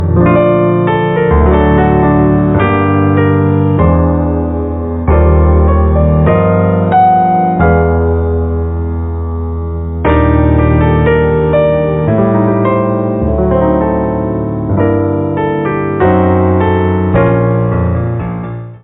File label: piano